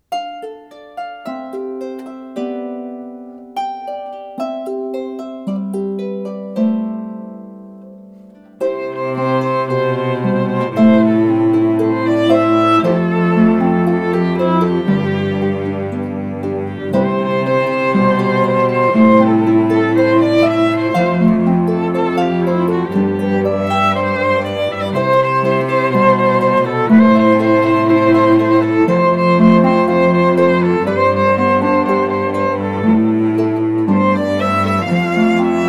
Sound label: harp trio